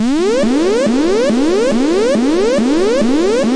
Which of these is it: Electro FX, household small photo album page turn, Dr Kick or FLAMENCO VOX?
Electro FX